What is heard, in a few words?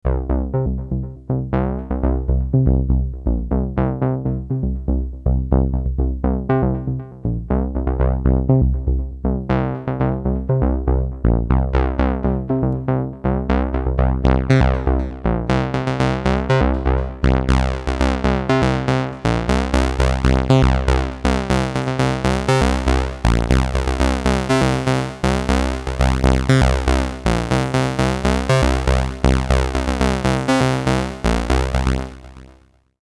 bass arp